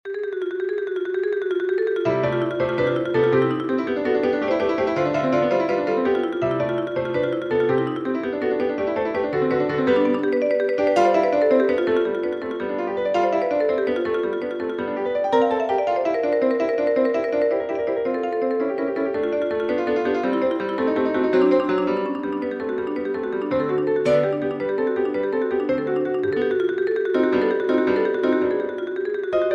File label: spinning song for marimba piano; mendelssohn bartholdy felix; from lieder ohne worte